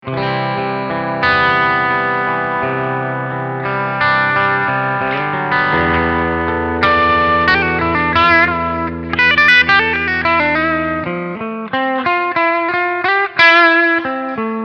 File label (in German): Steg Humbucker Clean